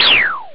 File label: lazer